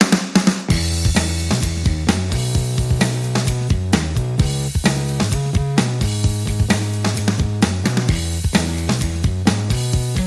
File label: No Rhythm Guitar Mix